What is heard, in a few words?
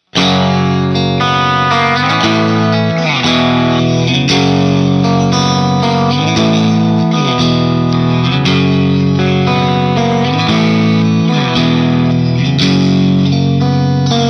hot, Single Coils, guitar